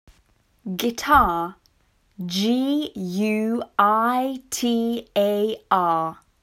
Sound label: Guitar